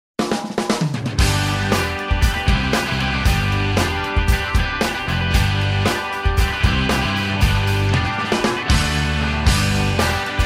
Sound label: Rock